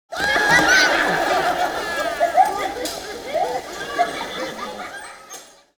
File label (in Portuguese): Rindo, Risadas Individuais